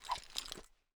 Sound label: machete; garrysmod